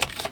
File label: Keycard Lock Slide; SFX Kit; Foley - Misc